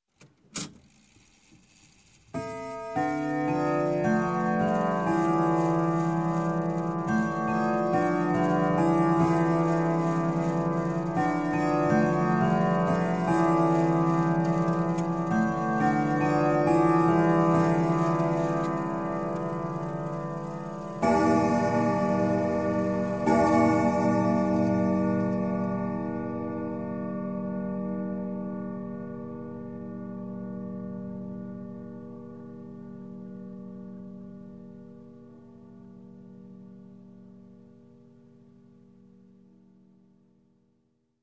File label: Clock, Grandfather Winchester Chime - Strike Tw; Strike Two O'clock; Sound Ideas 2000 Series